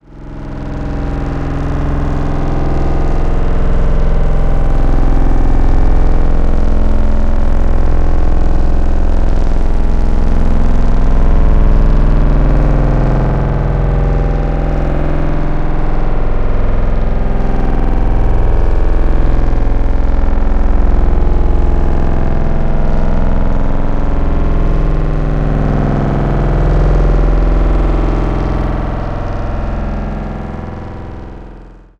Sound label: cinematic ambience; Bluezone; Destiny; Cinematic Ambiences And Sound Effects